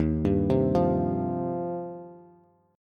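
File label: E flat 6th Add 9th, Bass; arpeggiated; 11,10,10,10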